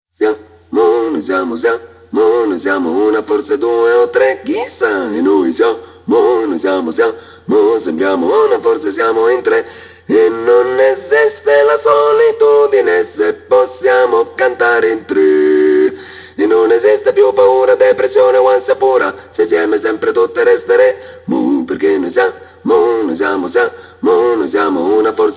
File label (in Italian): canto multifonico; Orchestra solista - Il lavoro vocale nel Teatro (DVD-ROM)